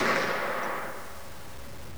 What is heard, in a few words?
mp40 ringout; WAR